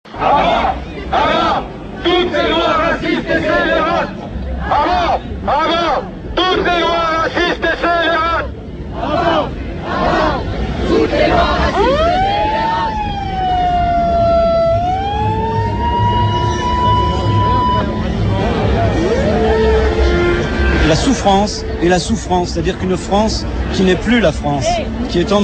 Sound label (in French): Manif Sans Papiers ambiance